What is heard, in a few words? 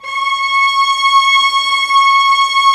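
Vio legato C#5; Digital Sound Factory Virtuoso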